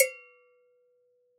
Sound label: Cowbell Black; World Percussion; Cymatics; Emperor World Percussion